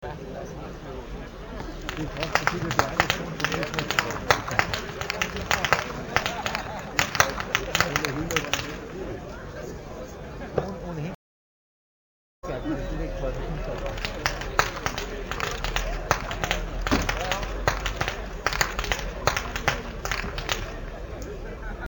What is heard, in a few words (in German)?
schnalzen, jugend; roth